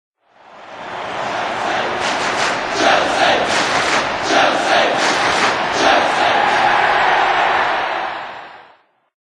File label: chant ringtone; chelsea